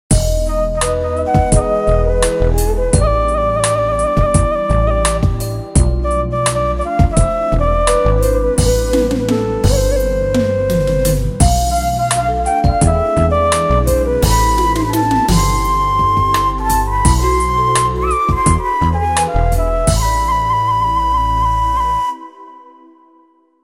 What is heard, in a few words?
Eastern Flute